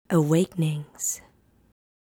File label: Awakenings, Female Vocals